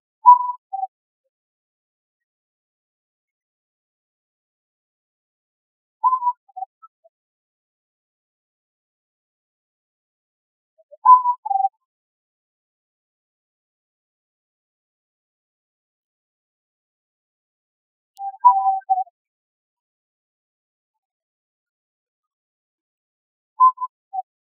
Oriental scops owl Otus sunia, Periyar TR Kerala

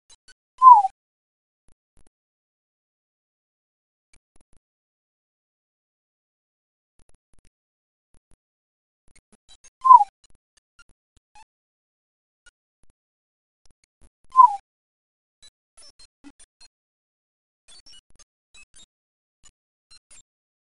collared scops owl

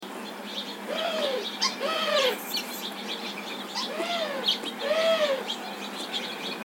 eurasian collared-dove alarm call

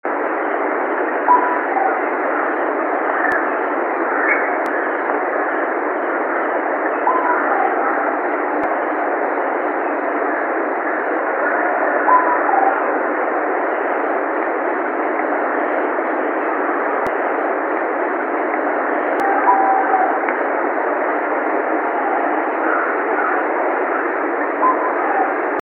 Oriental scops owl Otus sunia, Periyar TR Kerala

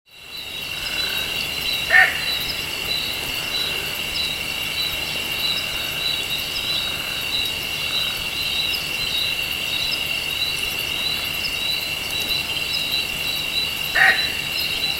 giant eagle owl, juv, Lk Baringo, night

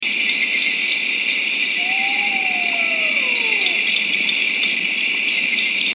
Pel's fishing owl call unfiltered; Marcot

